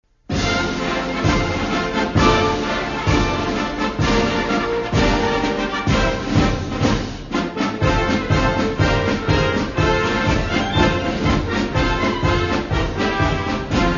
Marine Anthem (From the Halls of Montezuma) - Instrumental; July4